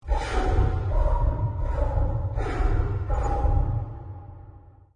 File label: ghoul; Be Cool Scooby-Doo! - It's Dark Out There; ThrillGames